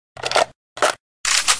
Kalash Reload; Metro

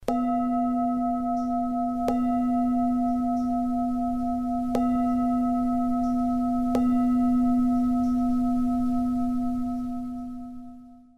Tibetan Singing Bowl

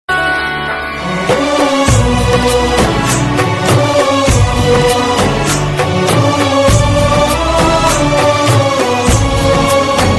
Music

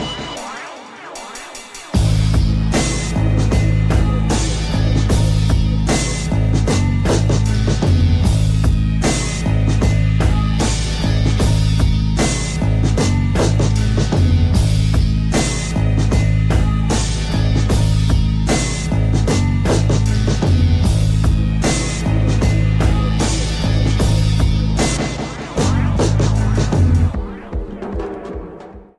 Trippy Space Hip Hop

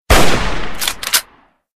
Gunshot Hunting rifle

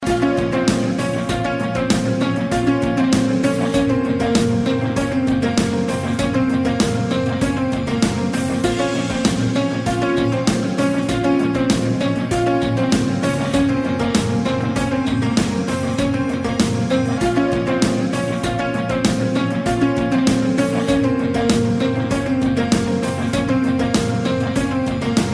Instrumental

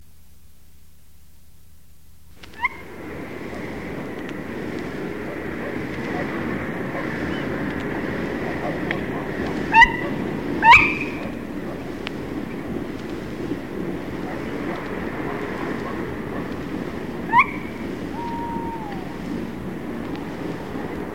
Tawny Owl

